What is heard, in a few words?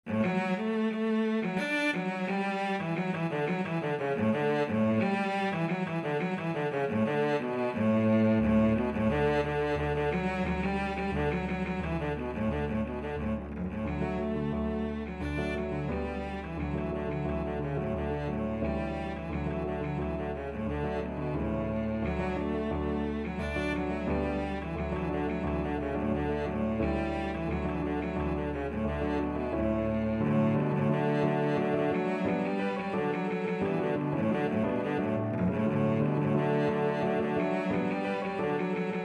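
violoncello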